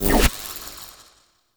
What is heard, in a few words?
Experiment Pistol Shot Stop; Crp Sent Experimental Pistol